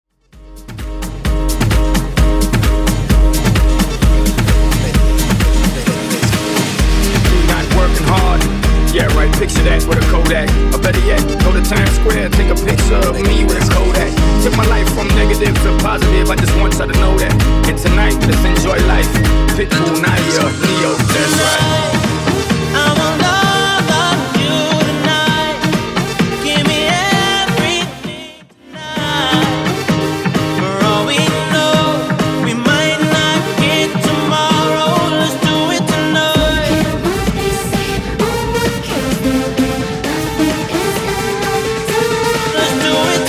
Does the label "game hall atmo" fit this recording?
No